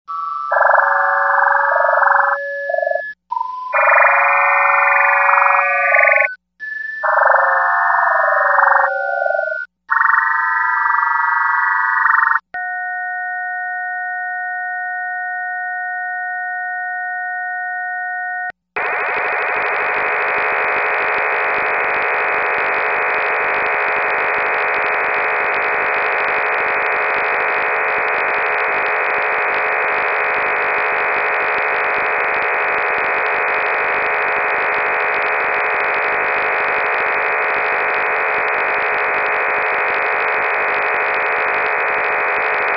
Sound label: easypal digital sstv pix 800x600